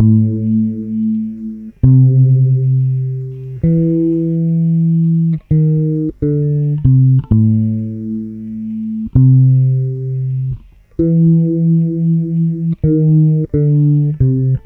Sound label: FEVER 131, Bass Grooves; USB Soundscan vol.30; AKAI